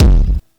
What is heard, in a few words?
Gated Kick; Yamaha PSR E305